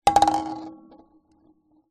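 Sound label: musical kalimba down on hard surface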